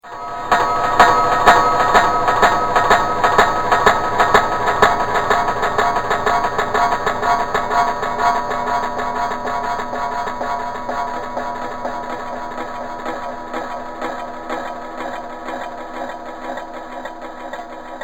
Dwarven Steel Industry, machinery; fantasy; Machinery Sound Effects

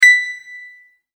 multimedia tone correct

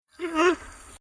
Jungle Animal Monkey Long Tailed Macaque Baby; Nature; Monkeys; Tom Hutchings

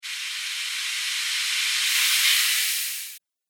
Whoosh Sandy; sound design; Whoosh Sound Effects

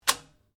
Rifle Remmington 700 7.62 mm Dry Fire; Guns; A selection of domesttic firearms; Rifles

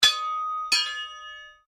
Strike Metal On Metal Heavy Ring Double

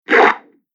Deep Rough Swipe, whoosh

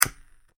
cigarette lighter button; Cigarette Lighter Igniting ( Button Style ); Lighters; McKinney Sound

